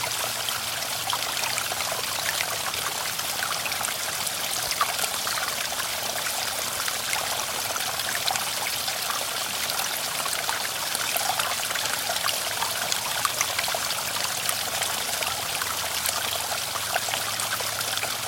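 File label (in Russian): слабое журчание ручейка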